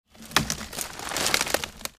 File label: beard growing; North woods of Wisco